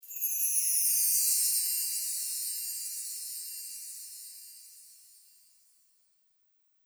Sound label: CHIME